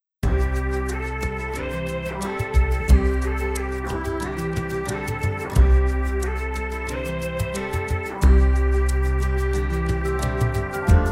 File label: Bagpipes